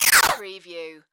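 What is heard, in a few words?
Scifi Production Element - Distorted Swipe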